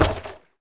wood collision; sfx

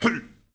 piglette damage; sfx

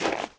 snowball roll; sfx

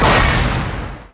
special egg explosion; sfx

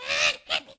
bird misc; sfx

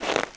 snowball roll; sfx

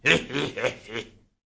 piglette oink; sfx

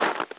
snowball roll; sfx

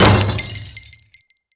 goldpot break; sfx

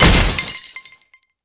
goldpot break; sfx

